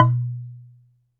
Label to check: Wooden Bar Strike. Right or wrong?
Right